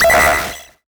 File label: Siren, Cwejman S1; Kerle Siren; DevSnd Cwejman S1 - RD